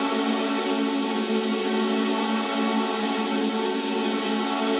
Pad Loop A, shimmer and sparkle; Pad Loops

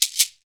PRC SHAKER, Afro Shakers; Roland LCDP14 Africa VOL-2

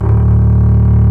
ARCO BASS D0, Solo Double Bass

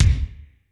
ROOM K, ROOM KITS; Crypton; DrumBase5000

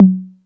Beat Box, E-MU Pro-Cussion; drum machine

